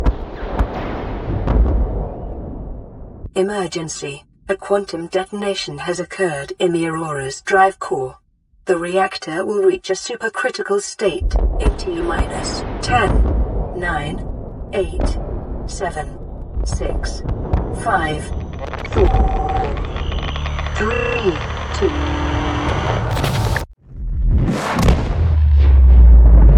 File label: Quantum Detonation